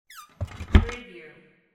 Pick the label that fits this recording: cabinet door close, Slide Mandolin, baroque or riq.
cabinet door close